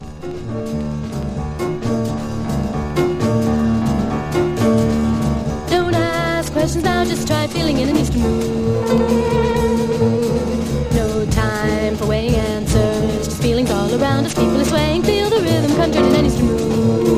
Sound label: BHAKTI JAZZ EASTERN MOOD